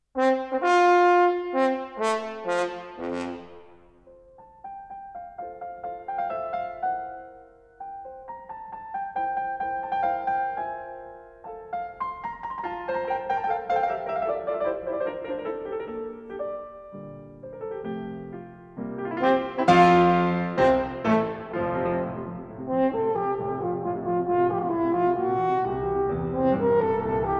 Sonata for Horn and Piano in F Major, Allegro moderat